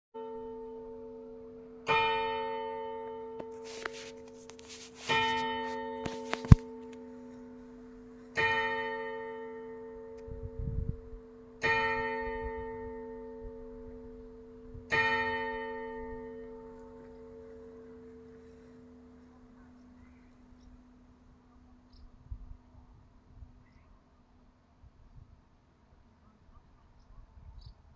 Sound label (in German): glocken